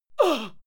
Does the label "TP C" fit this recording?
No